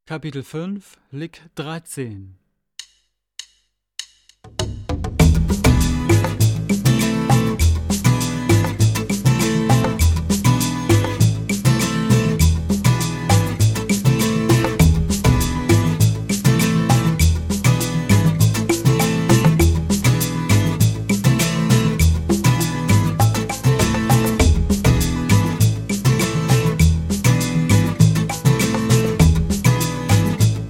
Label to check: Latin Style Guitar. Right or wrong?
Right